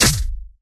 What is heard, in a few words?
Barier Generator shoot